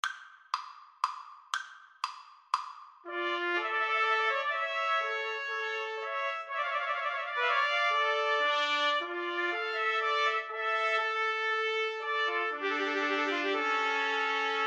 pastorale TPT trio, Trumpet Trio

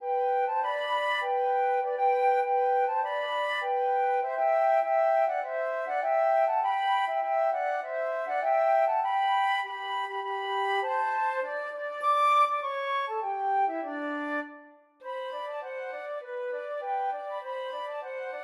good time flute duet

